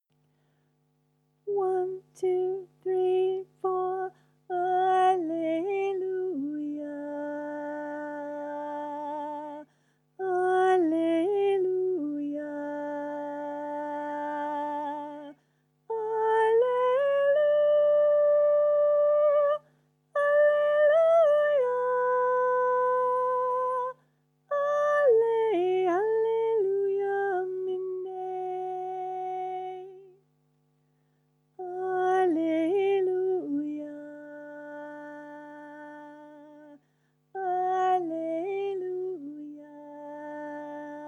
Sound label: Soprano 3 times